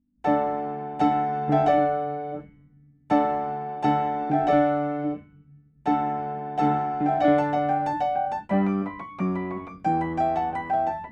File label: ACCOMPANIMENT; ZADOK NO. 3